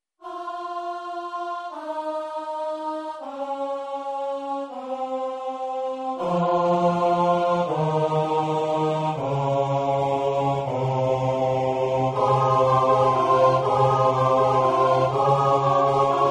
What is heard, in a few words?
Bass